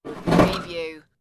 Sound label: open locker door